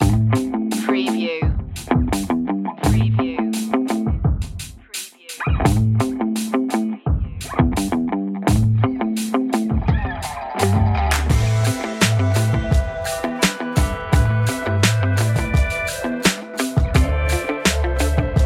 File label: urban hip hop